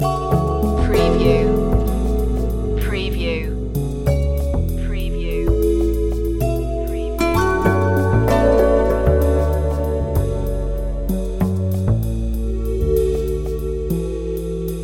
loop, chill

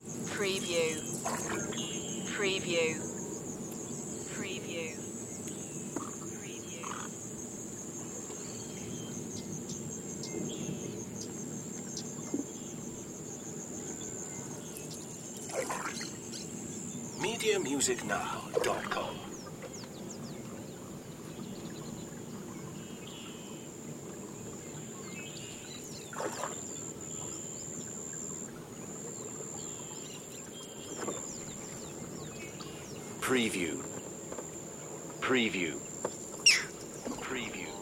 jungle river paddling

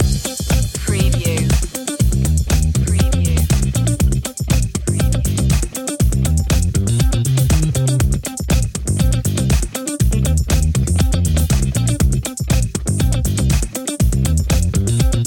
funky yeah loop long; media music now

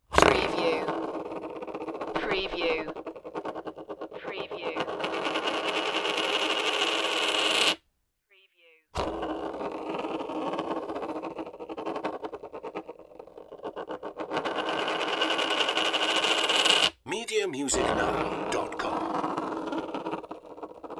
turning coin

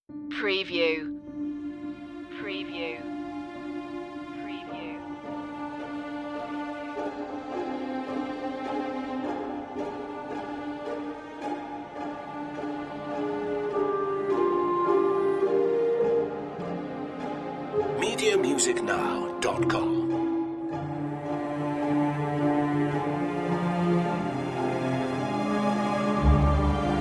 underscore

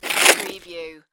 Snow and Ice, Ice slide pass by

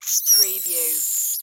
Monster Insect Scream

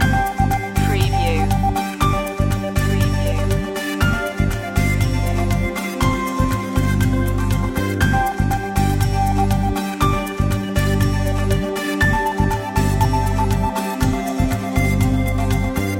oriental fantasy loop

